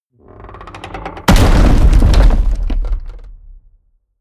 hit wood, hits impacts

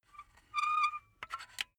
silicone gun empty pump slow; berufe; handwerker; soundpool

